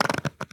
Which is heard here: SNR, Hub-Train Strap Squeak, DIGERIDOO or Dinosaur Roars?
Hub-Train Strap Squeak